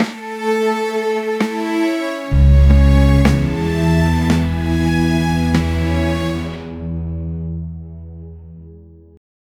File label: make like a tension ost, reveal